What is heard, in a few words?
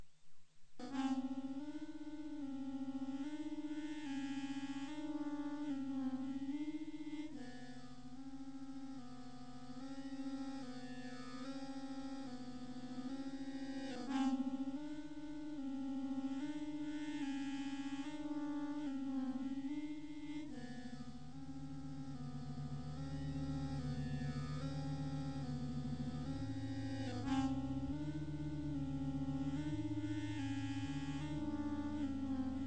armonica sirene